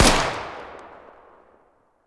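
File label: fire distant 300blk rifle exterior; gmod; arccw uc commons attachments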